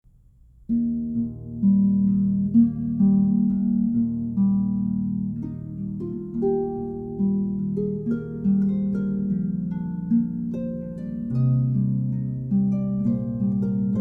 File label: Hymne solo, harps